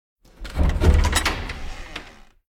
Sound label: Big Door Open; Still Life; windows